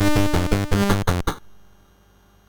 Moon Hop, sid, MOS6581R3

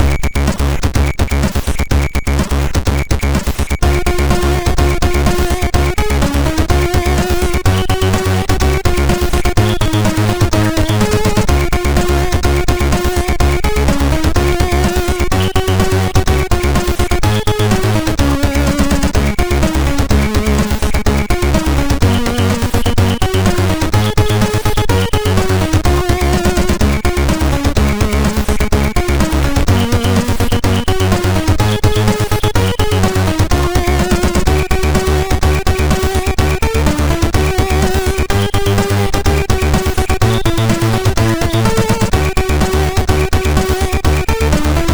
Christmas tune, sid, MOS6581R2

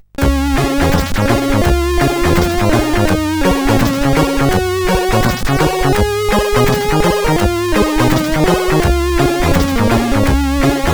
Reggae Trip, sid CSG8580R5

